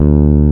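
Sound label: MAGIC BASS D3; MAGIC BS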